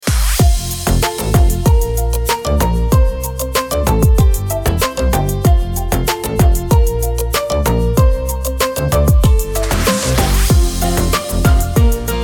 short background music for video vlog dance funny tropical house